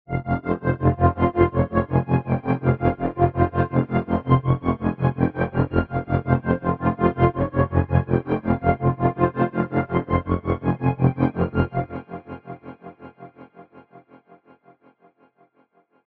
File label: trap; 8 expansion trap pack bundle; red edition